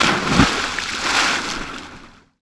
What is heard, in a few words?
splash; Splashdown; XBOX